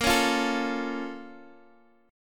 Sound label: A sharp Minor Major 7th, down, Guitar, Open D